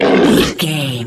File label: troll monster hurt pain